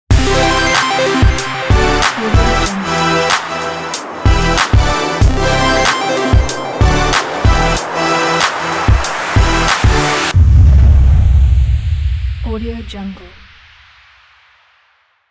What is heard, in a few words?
future logo; 5dollar music